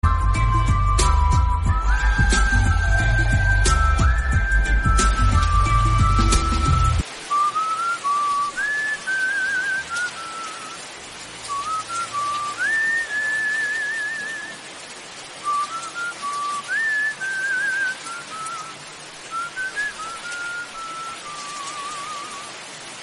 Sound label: refreshing whistle remix music song tone ringtone